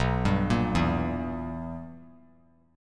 B Major 7th Suspended 4th Sharp 5th, chord; BM7sus4#5; arpeggiated; JGuitar

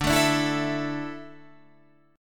D Suspended 2nd D down, chord

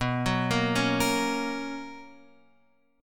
B Major 7th Suspended 2nd, chord; BM7sus2 Chord {7 9 8 6 X 6} arpeggiated

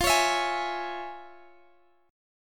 F Minor Major 7th Sharp 5th, Guitar Open G, chord

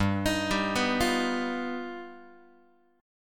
G Augmented 7th, Guitar; G+7 arpeggiated; x,3,6,5,3,4; JGuitar

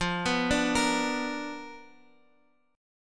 B Minor Major 7th Flat 5th F, chord

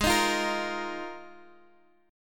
D sharp Suspended 2nd Suspended 4th G sharp

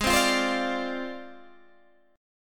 Gsharp 6th Flat 5th Gsharp Guitar Open G

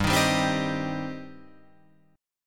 G Suspended 4th Sharp 5th, Guitar Open F; strummed

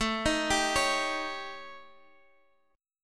A 7th Flat 5th A, Guitar Open G; chord

